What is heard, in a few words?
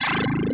Power On; sky adventure arcade